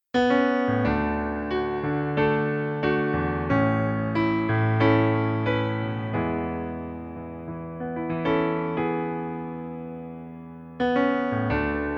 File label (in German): Pianoplayback E-Dur